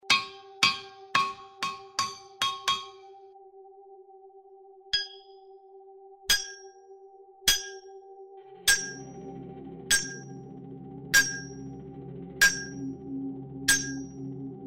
Mechanic Hydraulic Pneumatic Sounds; machine sound fx

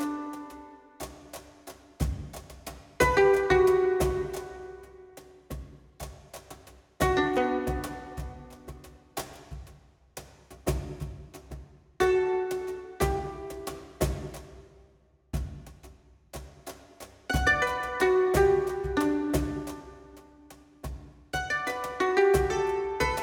Raw Mando perc 120 BPM; enchanted forest fantasy rpg kit; 4/4

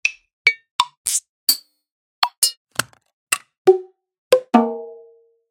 Clicks; organic ui building game sfx; APPlicable Sounds

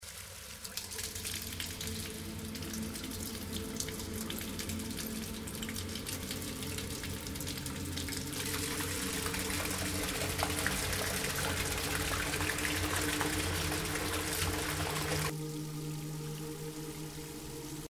Water; realistic atmo pack

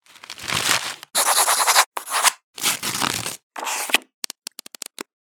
Paper, organic ui game sfx; APPlicable Sounds; building

